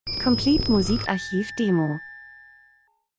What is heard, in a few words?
transition; Transition & Movement - hell Industrie Delay spannend